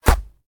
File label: colt hit; firefight